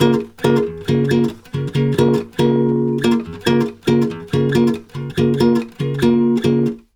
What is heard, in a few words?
BOSSA C#; USB Soundscan; AKAI; Brazil Bossa